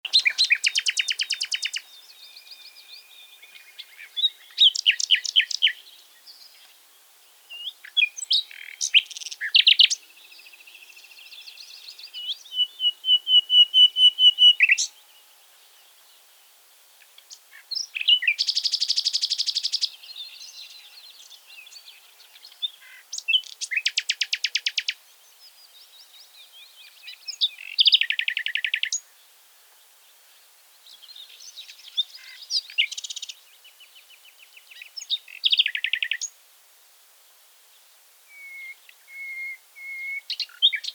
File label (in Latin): Luscinia megarhynchos; SETE